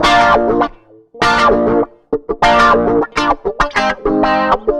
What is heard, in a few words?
Dirty Funk; лупы